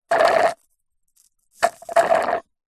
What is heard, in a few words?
food drink milkshake thick suck dregs straw slurp